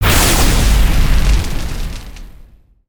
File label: Spinjitsu Lightning End Blast